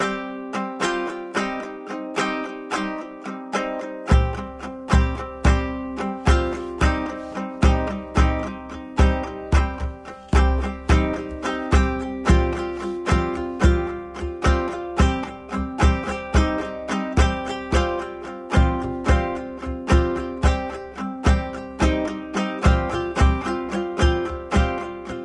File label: bard kitara drum; assassin s creed valhalla